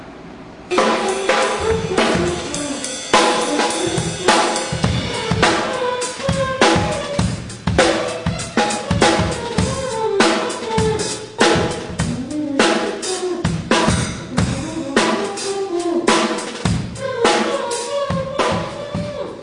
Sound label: drums